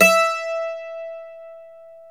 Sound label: MANDOLIN